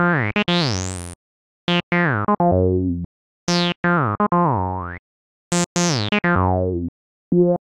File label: Legato Mode